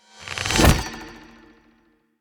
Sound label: golden bow instakill arrow shot